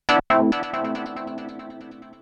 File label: KEY SHOT TEKNO 140 A; Techno2; Singleshots